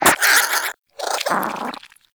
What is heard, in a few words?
Vocal Tranquillized, Hunting Critters; Nexifera